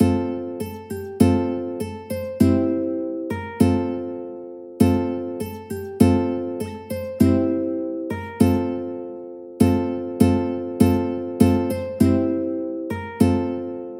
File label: ukulele